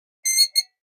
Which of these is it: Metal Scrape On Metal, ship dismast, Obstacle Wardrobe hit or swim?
Metal Scrape On Metal